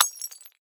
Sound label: glass hit; teardown ps5 windows xbox series xs gamerip 2022